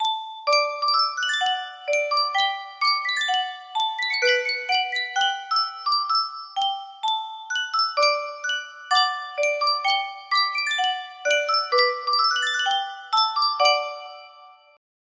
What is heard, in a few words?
Sankyo music box